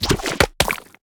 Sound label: Otter taunt